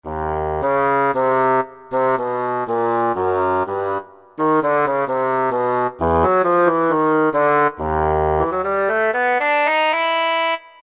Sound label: BASSOON; Roland SN-U110-06